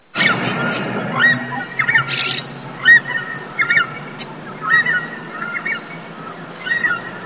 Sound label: Chauna torquata